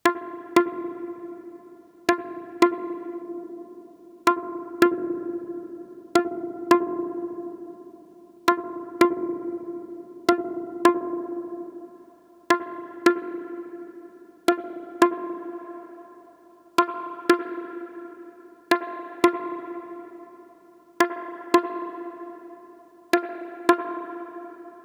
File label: Moog Tone; Vermona VSR 3.2